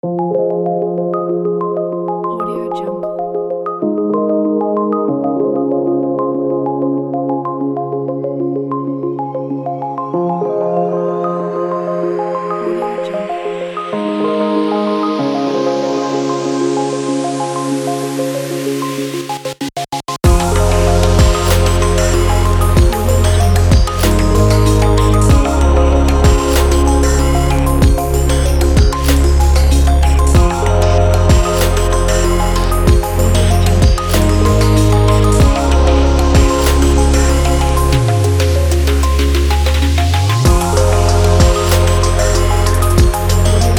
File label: abstract documentary technology glitch